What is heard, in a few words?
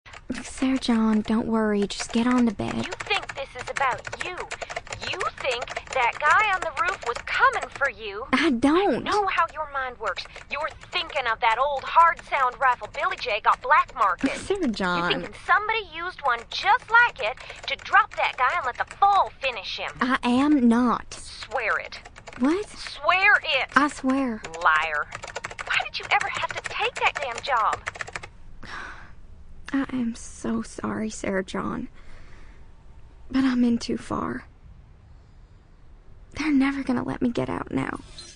Hard sound rifle